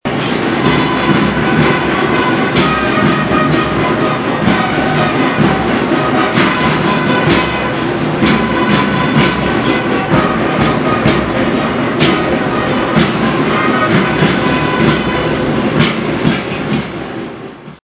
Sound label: Marching Band; childrens day